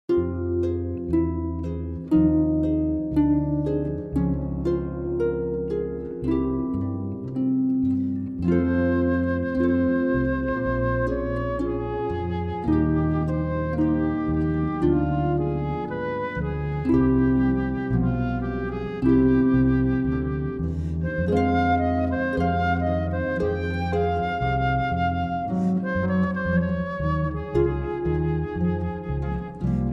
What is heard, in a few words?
harp flute bass cocktail; Wedding